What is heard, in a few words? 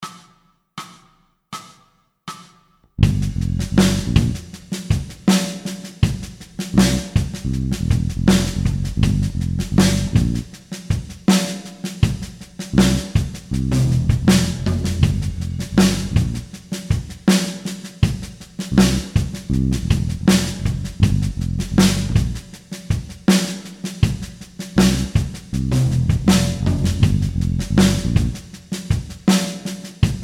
Slide Full Jam backing, Guitar; Guitar Techniques 2017 Issue 272 August